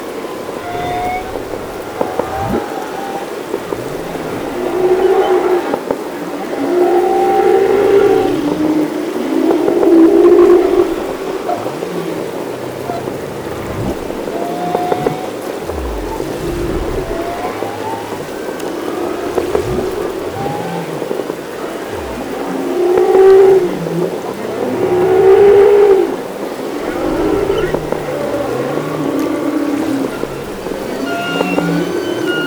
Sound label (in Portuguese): Canto Jubarte